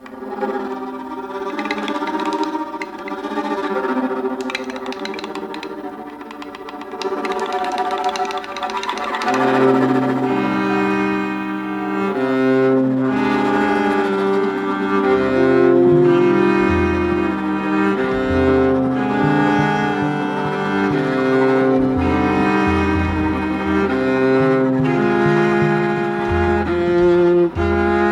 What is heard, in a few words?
Viola Interlude